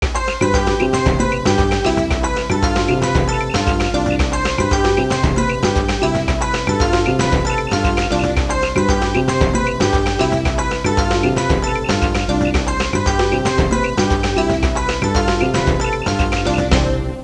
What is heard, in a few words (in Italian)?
suonerie contemporanea; musica contemporary